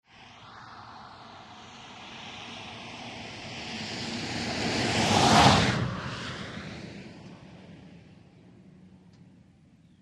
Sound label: Cars Pass Highway, Highway Traffic, Light; Close Perspective Cars; TRAFFIC HIGHWAY: Individual cars pass on highway.